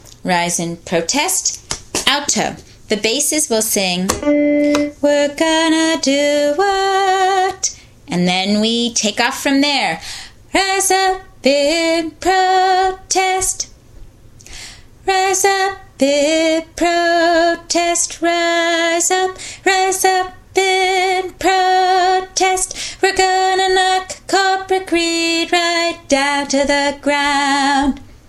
alto

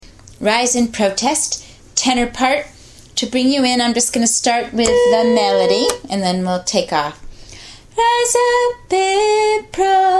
tenor drill

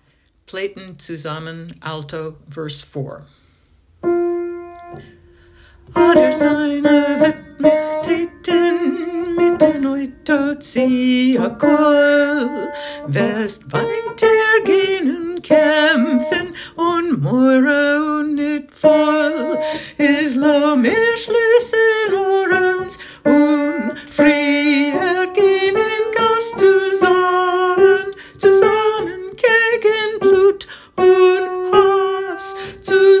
alto verse; verse 4; Pleytem Tzusamen

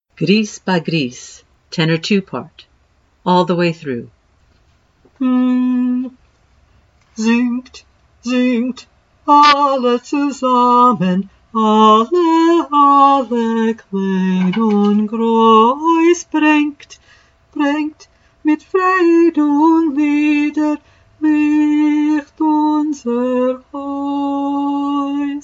tenor II, chorus; all the way through

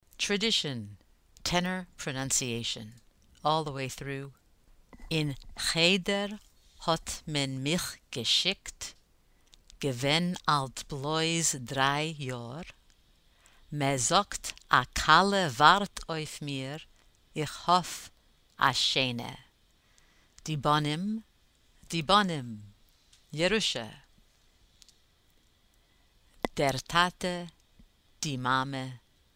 tenor pronunciation; all the way through

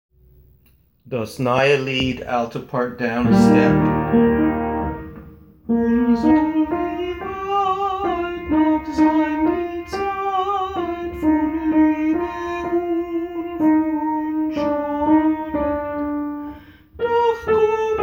alto

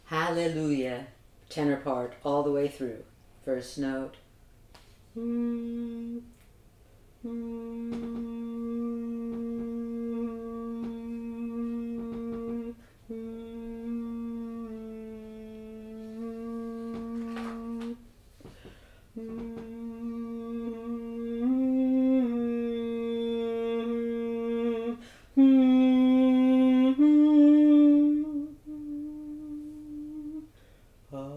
tenor; Haleluye

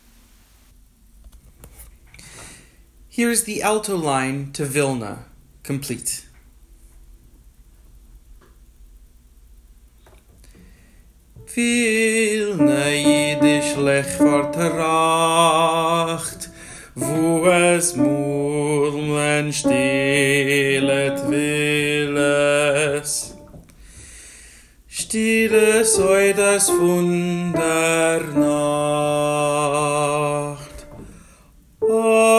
Verse Alto Part; Vilne

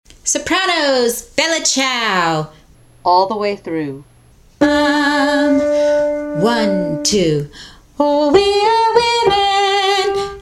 Soprano I; all the way through; Bella Ciao

